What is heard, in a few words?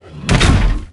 fall; quake2; mnhcoop; berserk